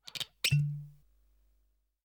Ontkurken Fles (Piepend); KMP Film Video Sound Effects

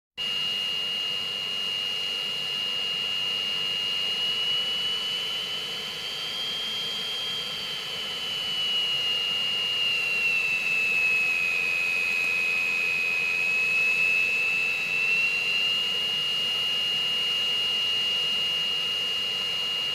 Vliegtuig Motor Geluid, verkeer algemeen; KMP Film Video Sound Effects

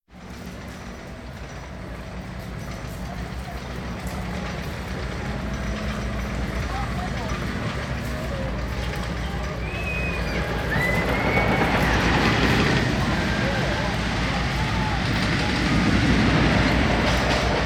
Rollercoaster Rit; KMP Film Video Sound Effects

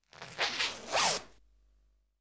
Rits Naar Beneden; KMP Film Video Sound Effects

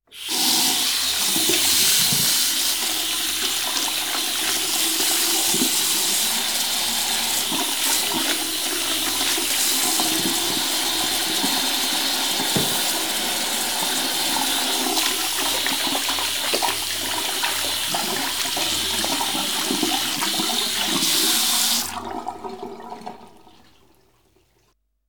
Handen Wassen In Gootsteen; KMP Film Video Sound Effects

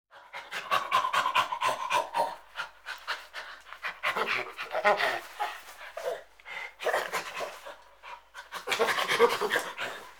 Aap Chimpansee lach; KMP Film Video Sound Effects

